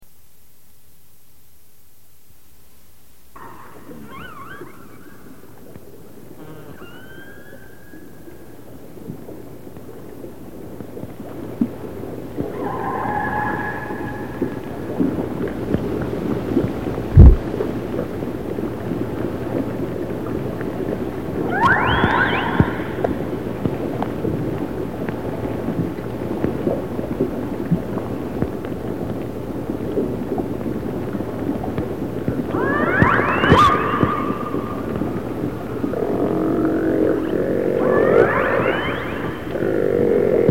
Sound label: Resident Orca AR Clan